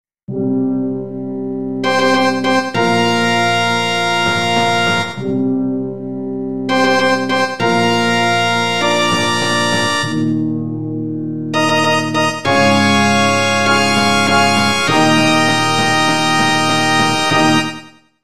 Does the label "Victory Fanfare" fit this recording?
Yes